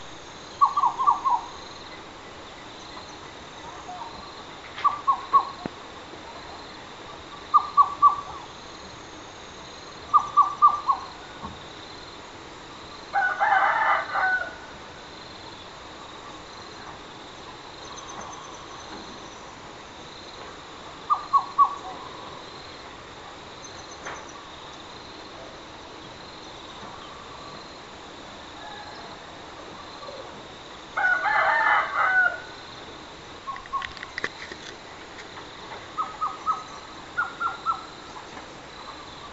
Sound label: Madagascar Cuckoo Cuculus rochii